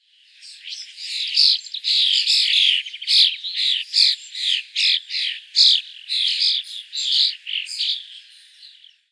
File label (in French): etourneau appel